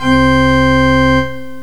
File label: church organ